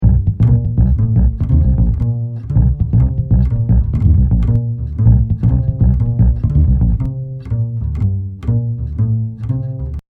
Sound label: ringtone